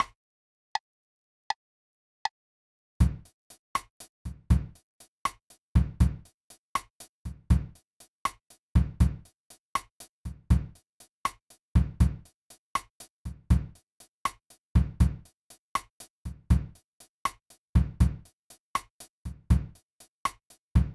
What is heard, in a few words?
blues drums simple